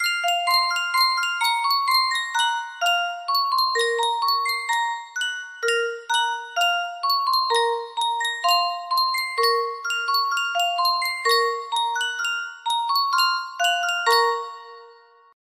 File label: Sankyo Music Box, music box melody